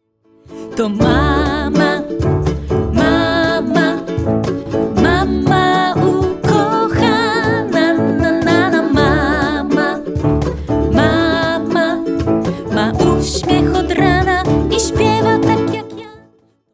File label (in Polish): mama ukochana